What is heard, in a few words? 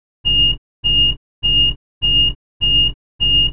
Draw Fwd, train; Z8100; OpenBVE